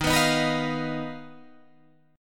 D sharp Suspended 2nd Sharp 5th D sharp Guitar Drop D